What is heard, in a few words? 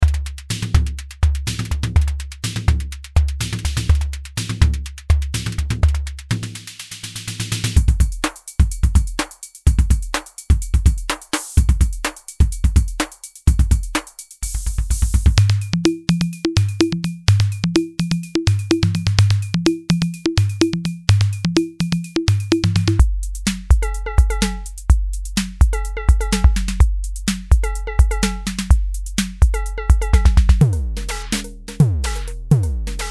Electro